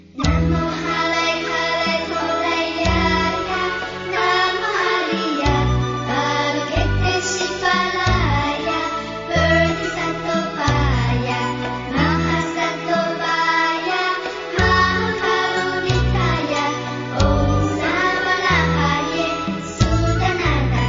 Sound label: buddhist, Sagem Melody; MY-V-75-CD